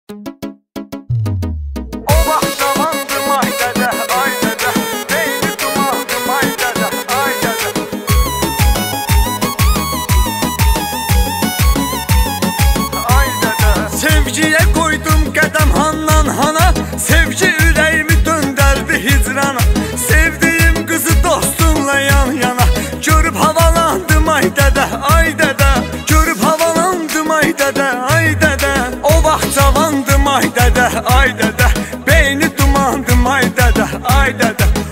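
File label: bass boosted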